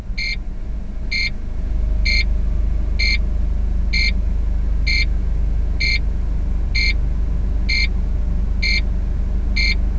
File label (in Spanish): sonido combi